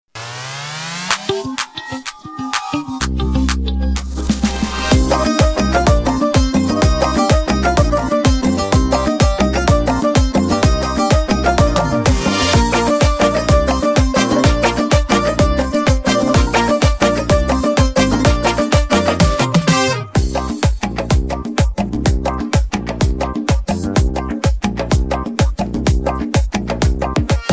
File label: instrumental; persian